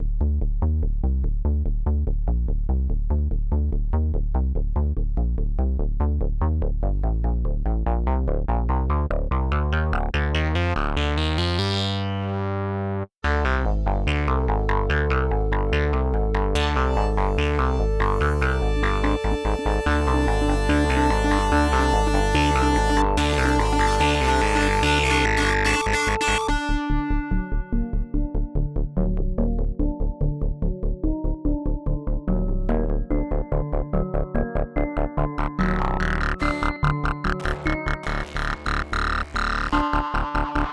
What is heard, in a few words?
noise pollution